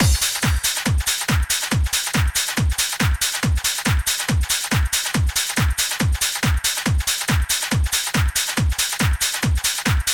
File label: House, 4-Floor Breaks Kit